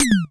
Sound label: DOWN PART, DRUMS PERC; Best Service Dream Experience